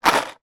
plastic box of drywall screws movement; industrial

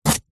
food turmeric or ginger raw single slice knife

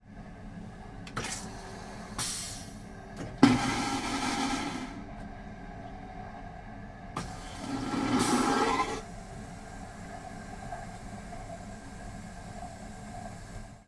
transportation train toilet vacuum flush

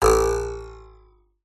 cartoon ruler twang metal

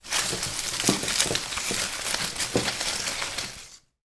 foley flowers in vase ruffle movement

